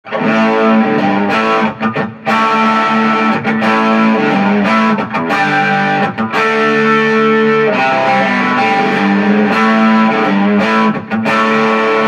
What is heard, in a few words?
tube drive bridge pickup; effectrode